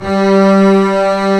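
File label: arco basses; E-MU Formula 4000 Series Vol. 5; Protozoa; Proteus2 Presets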